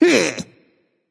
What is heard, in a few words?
tongue; smite; arachne